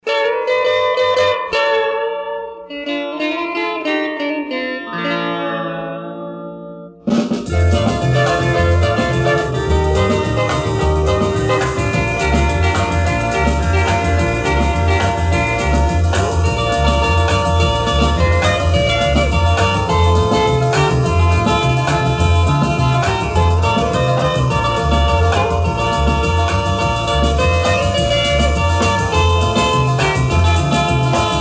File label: bells; brazilian